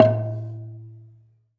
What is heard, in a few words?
Gambang Soft Beater, Gamelan